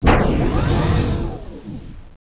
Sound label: pickup doh; Gunlok